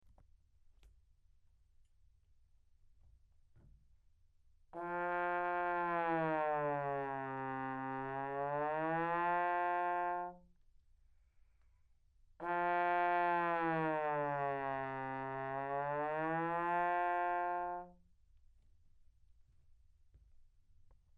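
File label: glissando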